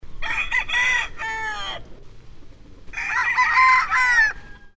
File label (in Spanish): gallo